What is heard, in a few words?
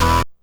Synth Hit E